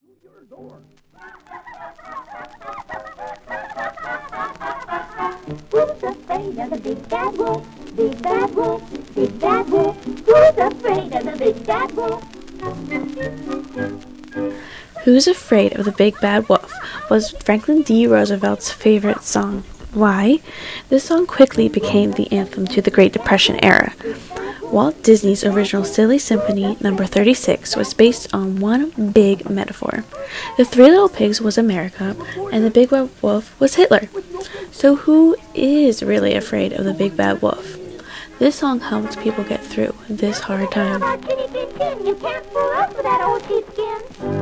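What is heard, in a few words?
Music with Recording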